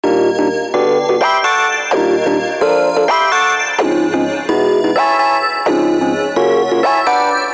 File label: Synth Lead